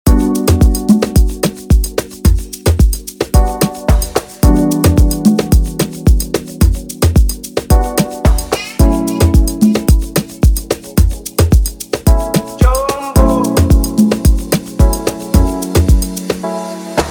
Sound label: Sounds Of Africa